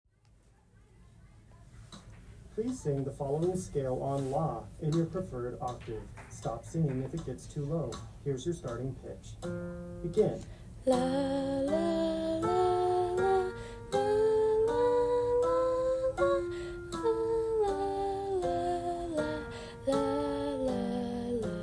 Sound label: soprano; Audition